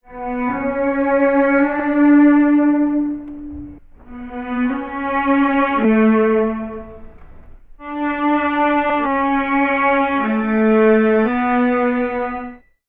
strings, Sad Loops

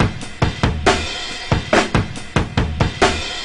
chopped, Boombap, Drum loops

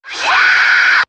"yeah" SCREAM

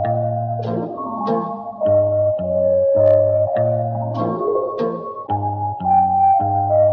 keys

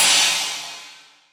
Cymbals

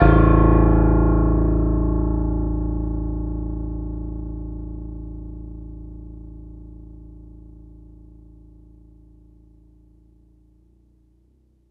Piano

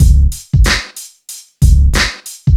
room shaka, Drum loops Boombap

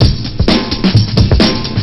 Drum loops, Funky

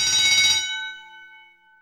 alarm clock

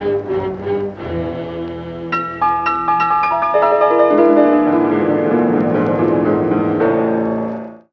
dramatic music

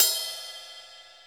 Hats Real Open

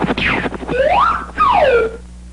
jumps

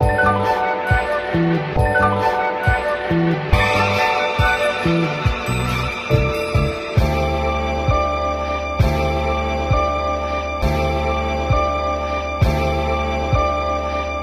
strings, loops, phonky

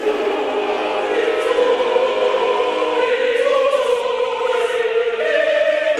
voice cruddy choir; Loops; Sad

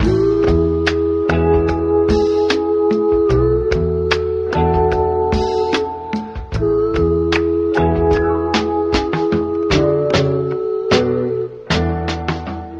full, Loops Phonky

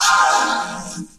ah autotuned; ooh ahh

